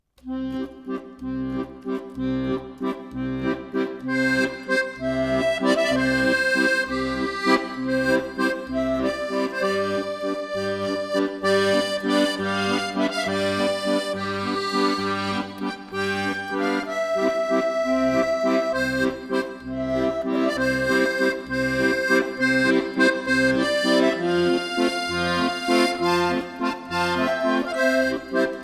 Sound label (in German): Akkord